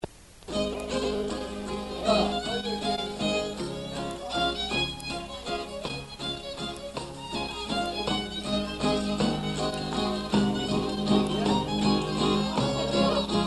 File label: Mazurka